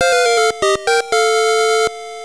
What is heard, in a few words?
level up; the art of leveling up